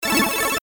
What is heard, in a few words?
WIN; Ancient Forest